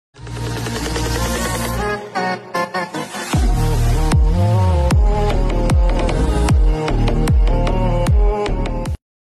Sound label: mobile ringtones